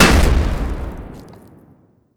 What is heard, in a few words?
landmine explosion; Rust